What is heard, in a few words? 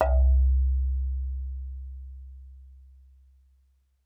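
Marimba yarn fortissimo C2; MIS Pitches - 2014; Percussion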